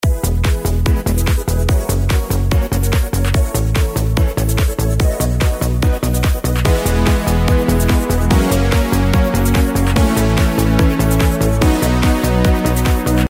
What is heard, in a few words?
outro; Shopping Fiend